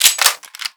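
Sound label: weapon foley ump45 reload chamber; Call of Duty Modern Warfare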